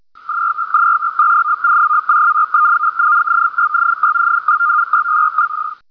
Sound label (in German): Amtor Kurzwelle; hamradio; digital communication modes; Digimodes